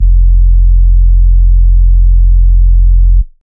Sub F, Dubstep; Bass One Shots; Ghosthack; Dubstep Sound Arsenal; 50 Bass One Shots

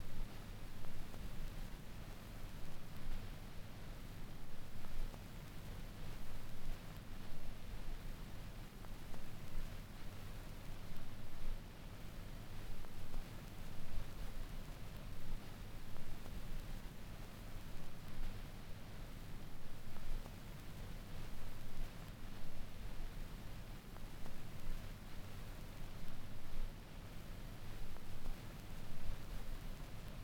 Allured - 127 BPM G# Min Noise; Loop Stems; Euphoria; Cymatics; GHOST (Beta Pack)

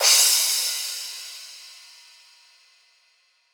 Riddim Crash; One Shots; Hats & Cymbals; Ghosthack; Riddim Essentials 2020

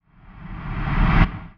Suckback SFX; Horizon; GhostHack; Drum Mega Bundle; Abstract Percussions Volume 4

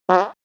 fx fart; Cartoon - film effects

